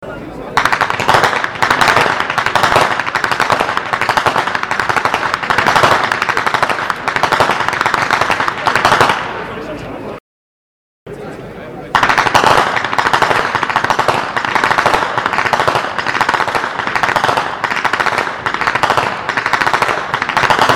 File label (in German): schnalzen; feldkirchen